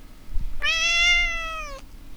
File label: meoww